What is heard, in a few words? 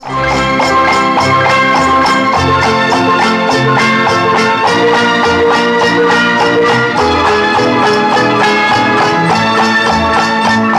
Music Ringtone